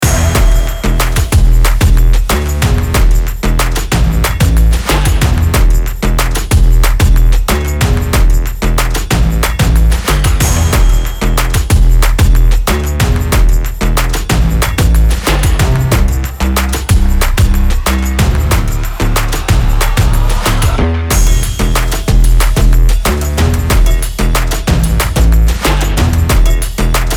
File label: Summer Energetic Fashion Percussion Stomp Loop